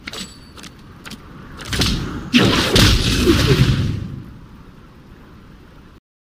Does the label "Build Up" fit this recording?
No